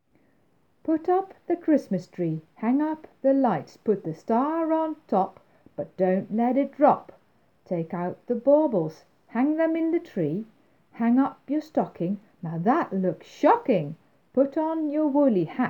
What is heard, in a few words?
put up the xmas tree chant